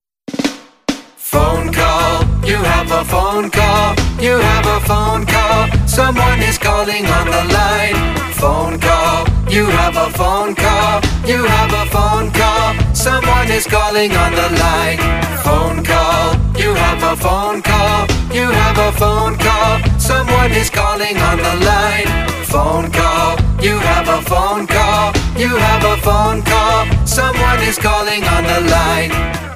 reggae ringtone

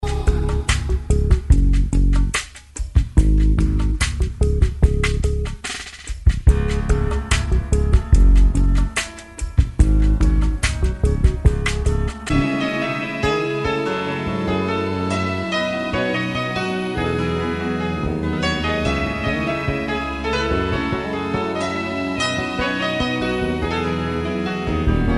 tamil cute alarm; Indian

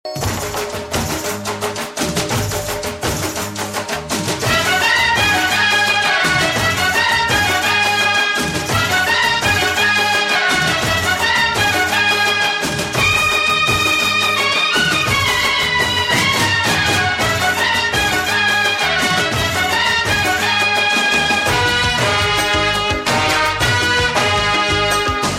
instrument; Khalnayak; Indian

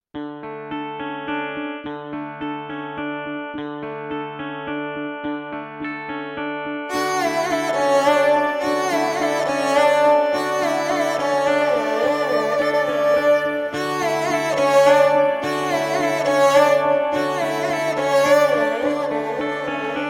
instrumental; kai po che; Indian

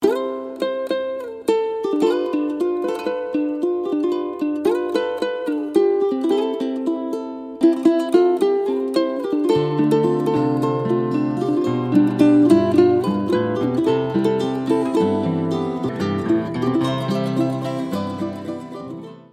ringtone symphony; Indian

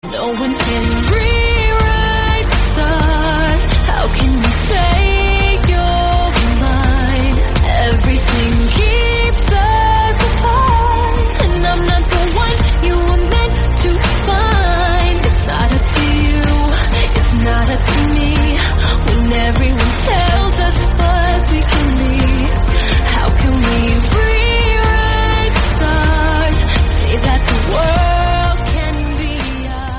english song

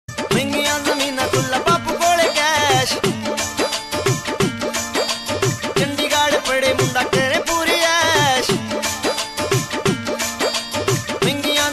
punjabi music; Indian

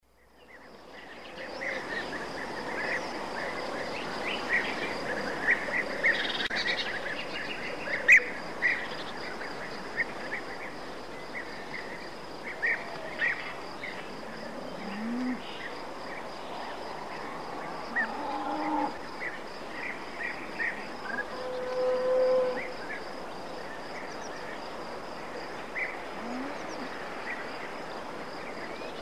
various birds; Animals

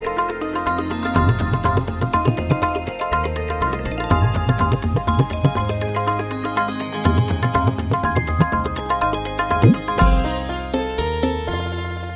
indian instrumental

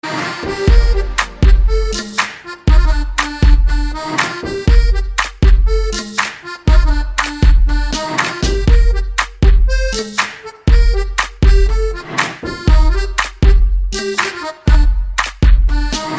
padapadakuthu beat; Indian

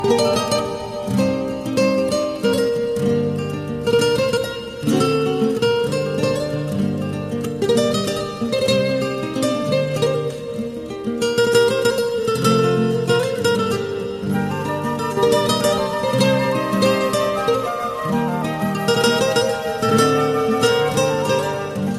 nice iranian music

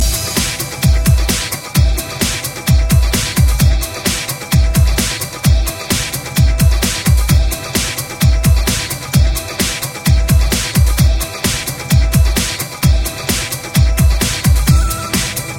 indian bass beat

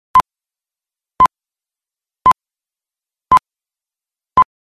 iphone 5 countdown

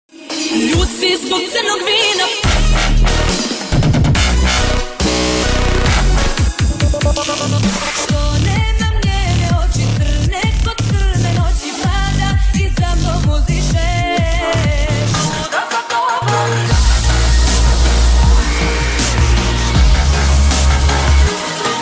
Balkan Dubstep; Bollywood

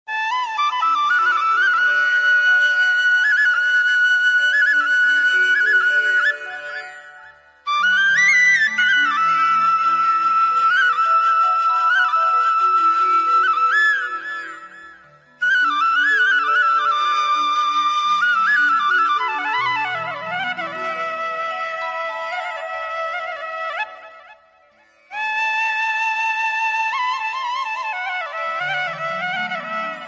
devotional fluit; Religious

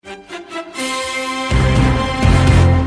notification; NFL MNF

